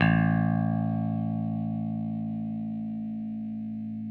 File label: 6STR BASS; Sampleheads; Whole Lotta Country 1 & 2